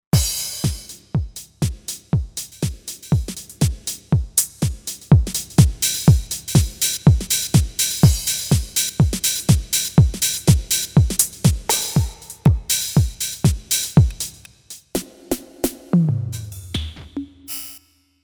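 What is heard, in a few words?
House Kit, synth drums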